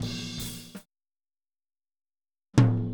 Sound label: Boogaloo Fill Tom Rack, 82 BPM, Drums; Sonic Foundry (Sony Creative Software) - Drums from the Big Room The Mixes